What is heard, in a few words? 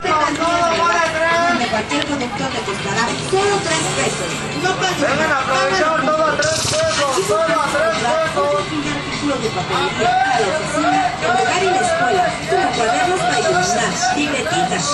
centro noise